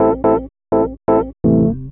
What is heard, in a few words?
house synth 125 A, keys